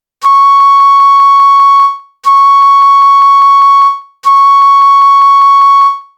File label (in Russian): 3 oktava 1 do diez flejta